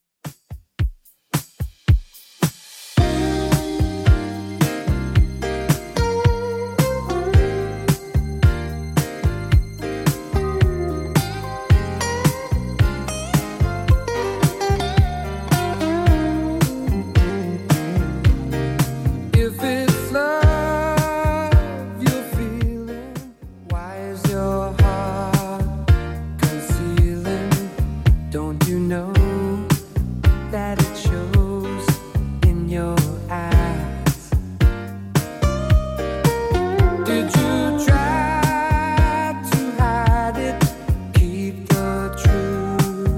80s Redrum 110bpm

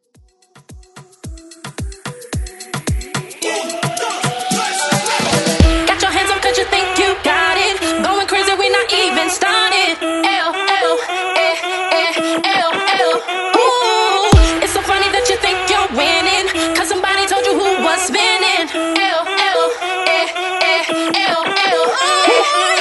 Moombah Mash Up

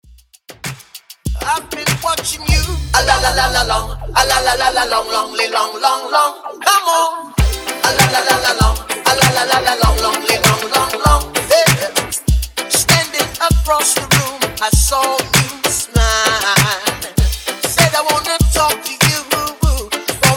Reggae 90s ReDrum In Outro 98 BPM

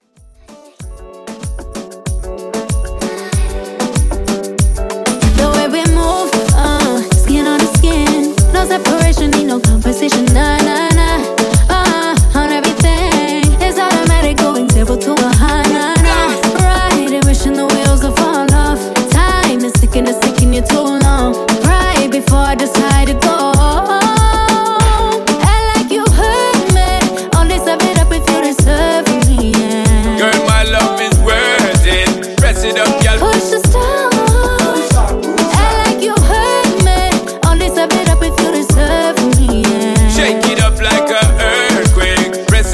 Moombahton, 95 bpm

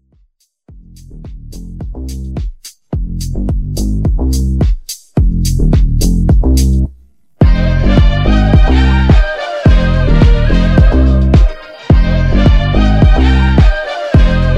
Classic Intro Blend 107 Bpm; remixplanet

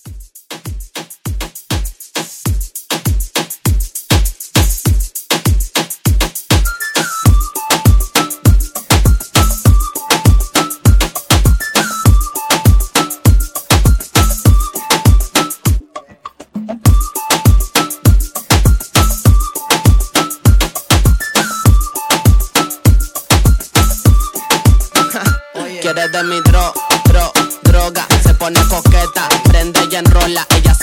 Moombah Latin Re Drum 100

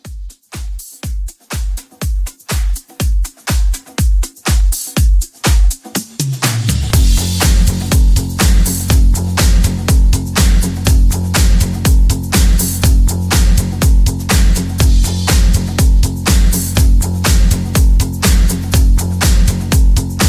80s House Re Drum

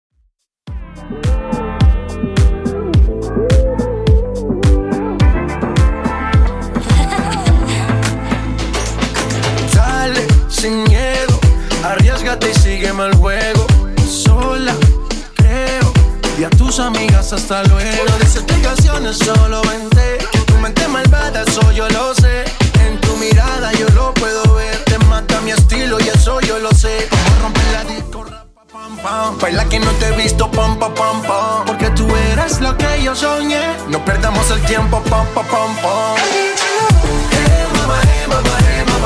Redrum 106bpm

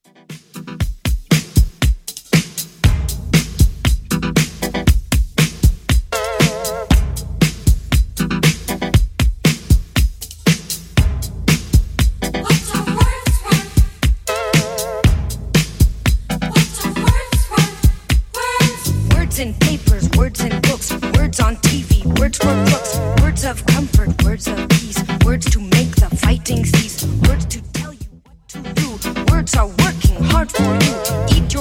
80s Redrum 118bpm

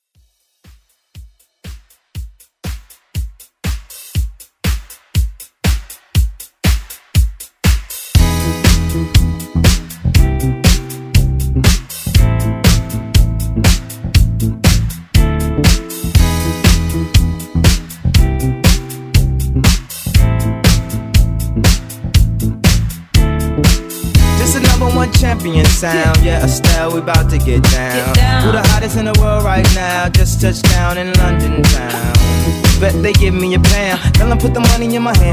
Rap Blend Redrum

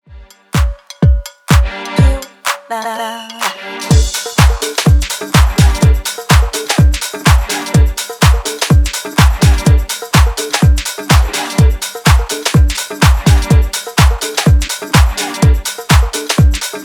125 Bpm

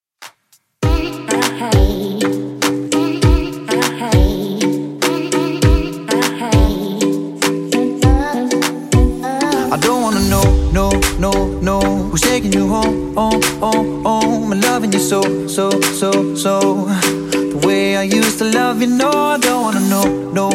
Redrum 100bpm

